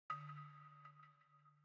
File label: Balafon; Frozen Flame; windows